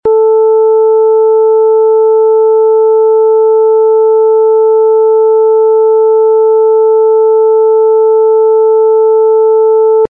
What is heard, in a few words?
Sine 440Hz full scale